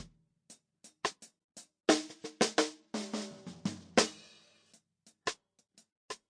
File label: snare mic; remixable collections; drums